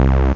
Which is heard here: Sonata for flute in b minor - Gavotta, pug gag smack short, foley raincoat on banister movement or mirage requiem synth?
mirage requiem synth